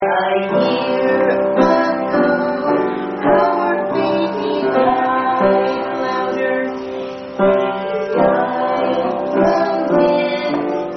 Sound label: Scary Old Ladies Sing Suicide Baby Song